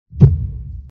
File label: Hit